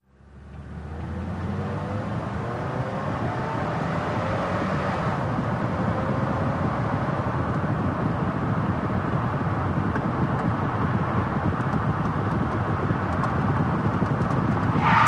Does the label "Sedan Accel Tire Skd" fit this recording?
Yes